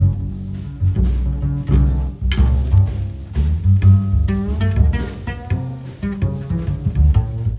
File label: bass